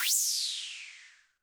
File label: Roland TD-20 Percussion